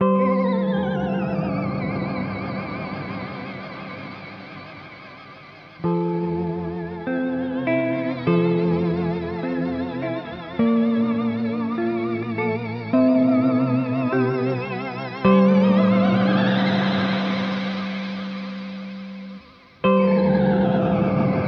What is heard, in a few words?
Astral Destiny Astral; Earthquaker Devices